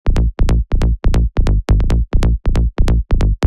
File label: Bass Midi trance